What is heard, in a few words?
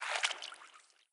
frog splash